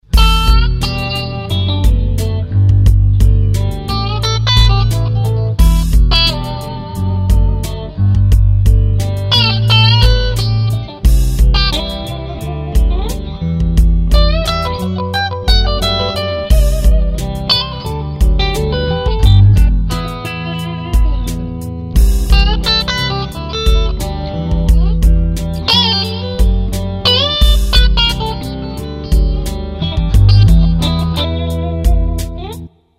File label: fingerstyle